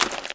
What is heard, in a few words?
mud step; Golden Crayon Sounds